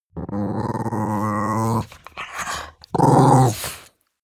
chihuahua growl stutter; natur-tiere; hunde

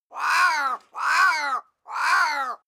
crows abstract; natur-tiere

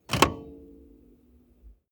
car bmw e46 interior seat lock in

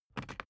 briefcase plastic latch lock key pull out; industrie wirtschaft; bueroarbeit

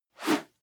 whoosh whistle

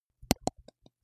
teeth clench multiple; koerpergeraeusche; menschen; soundpool

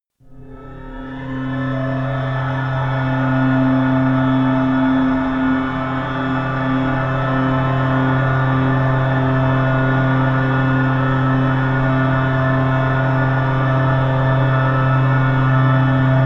simple drone; flaechen

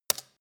keyboard hits hard, computer; kommunikation

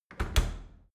dry cleaner close soft; maschinen; tueren

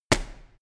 step land reverb bright; schritte

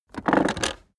car accessories armrest open soft; autos; verkehr transport

